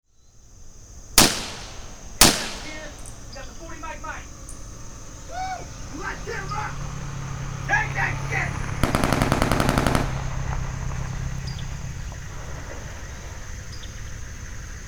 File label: military binaural recording